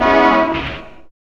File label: CHD SYN